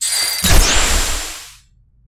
ice spell

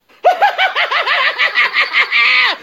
man's laugh

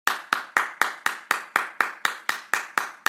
sus clappin

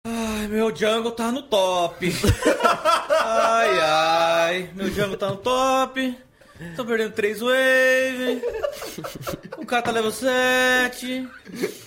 jungle no top

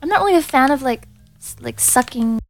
sucking

